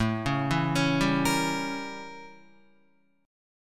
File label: A 7th Flat 9th A, Guitar; 4,4,4,6,7,6; arpeggiated